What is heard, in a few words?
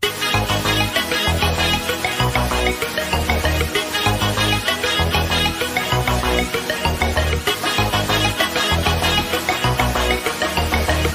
Ringtone Hindi Love